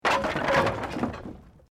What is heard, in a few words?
Wood Collapse